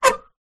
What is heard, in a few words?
metal scrape on metal small; foley